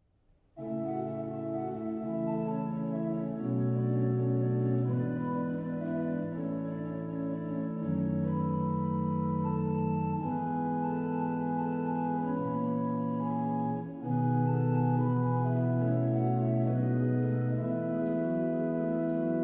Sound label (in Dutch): improvisatie, kerk; opeinde; gerkerk